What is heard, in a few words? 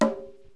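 small drum; drums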